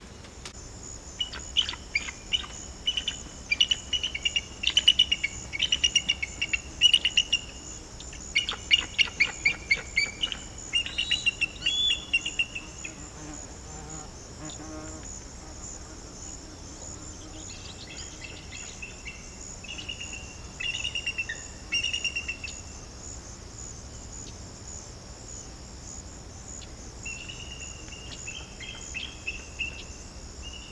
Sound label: eagles nearby